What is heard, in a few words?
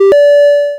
delivery cheer; Cozypaw-Hospital